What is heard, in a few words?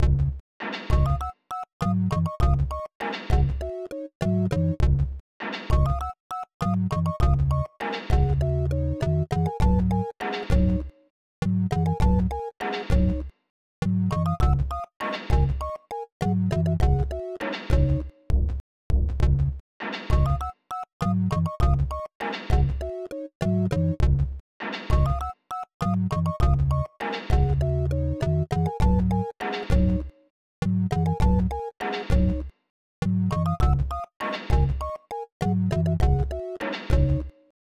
Sinwave